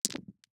DICE on Felt, Throw and Roll, Standard, 6 Six Dice; Samples; ArticulatedSounds; DICE Sfx Party (Extended)